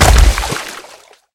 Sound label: marsh fall; Golden Crayon Sounds